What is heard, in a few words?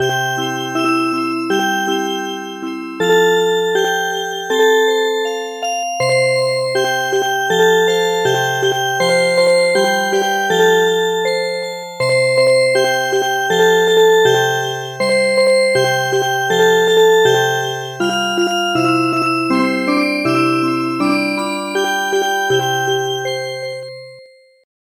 Music Box